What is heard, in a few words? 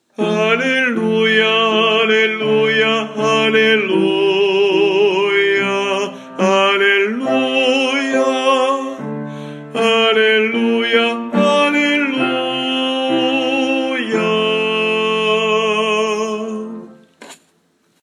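Alleluia, tenor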